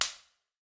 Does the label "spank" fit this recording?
Yes